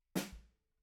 BD Out

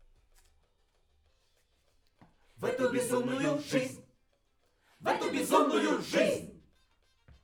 Backs SCHOEPS

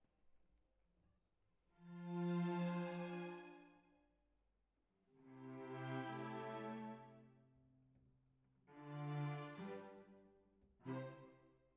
Cbasses

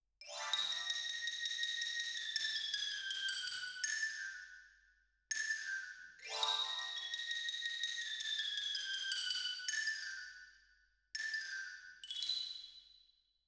Xylo

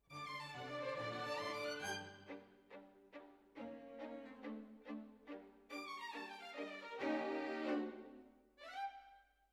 Violins Over

